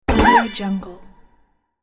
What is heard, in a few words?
cartoon voice splat hit